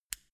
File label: mini switch turn on hard